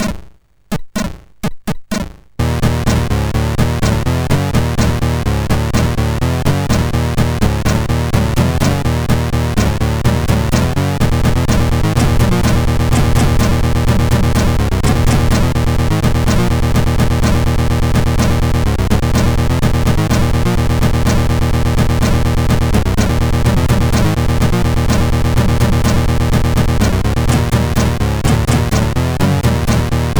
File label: Thrash, MOS6581R4; Insine; Zool